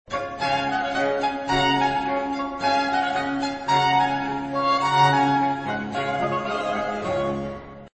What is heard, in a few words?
D american baroque; MagnaLoops